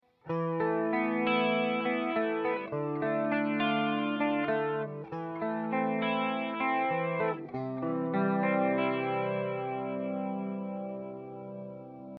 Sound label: Clean Chords